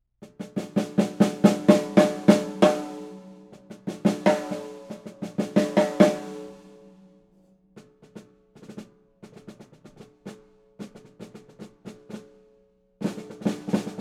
Maple Snare, 6.5x14 Limited Maple Snare with 30-degree Bearing Edges